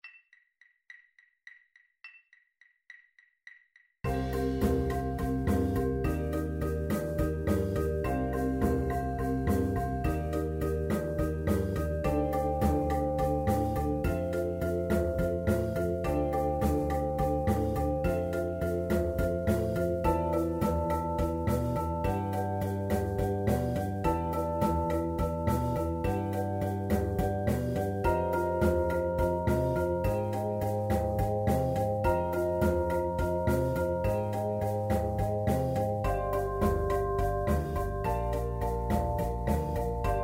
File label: 105bpm, Snare Exercises; Seven Eight; Drum Line & Front Ensemble Audition